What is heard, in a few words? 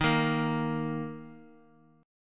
D sharp 5th; strummed; x,x,13,15; JGuitar